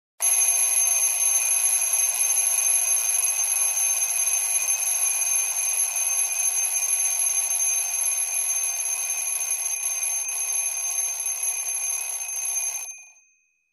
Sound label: Alarm Clock